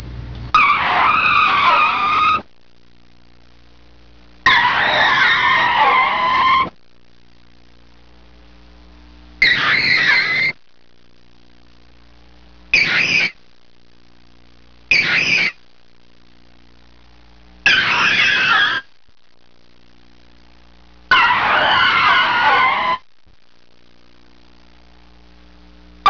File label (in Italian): Stridore Gomme; trasporti; auto